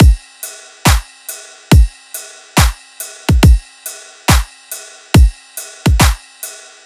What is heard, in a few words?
Riddim Drum Loop Full (140); Drum Loops; Ghosthack; Riddim Essentials 2020